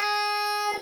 petrol station voice horn sound

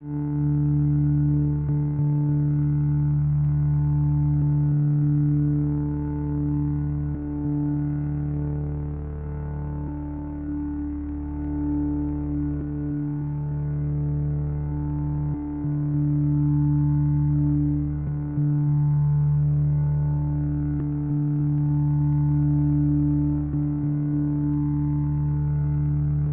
drone

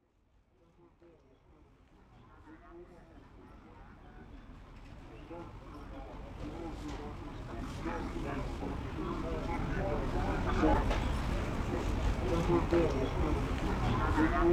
ambiance

